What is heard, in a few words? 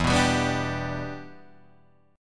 E Minor 7th, Guitar, Drop D